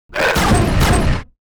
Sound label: attack, Mob